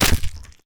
bite, melee; The Monster Plane; kolmods melee

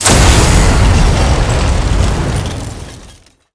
mortar explode; dumpster baby titan; ButcherExe

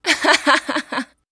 Accept

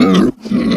pain; The Monster Plane; kolmods preatt

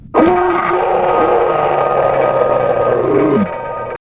squid stun; huggy wuggy snpc

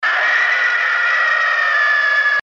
Jumpscare; Monty; copycats; PandaClones Jumpscares

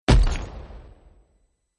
step; zoochosis; deep sea; lazynap

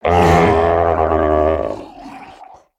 death, procoptodon; zoochosis pack

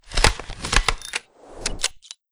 Grabpack Switch Hands Complete; grabpack upgraded; pp3

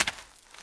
step; demon dogday

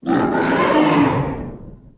boss scream; tv titan upgr; re-logic; terraria

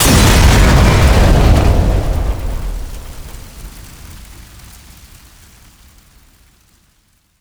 explode; skibidi critical dumpster; SkibidiHeavy

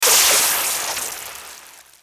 proj hit; water tower eye

